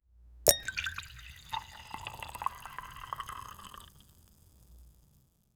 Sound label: a glass of wine is, drinks